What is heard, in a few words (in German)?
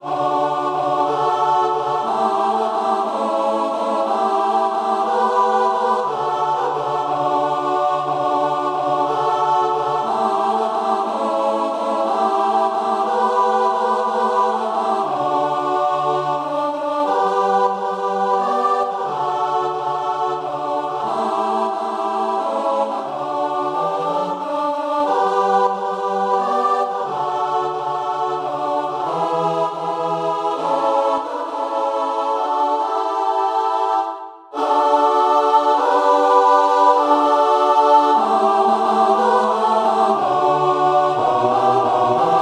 gemischter Chor dreistimmig